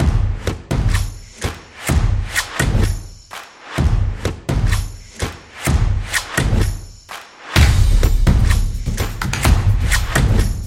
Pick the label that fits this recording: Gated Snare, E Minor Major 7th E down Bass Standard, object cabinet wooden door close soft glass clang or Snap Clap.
Snap Clap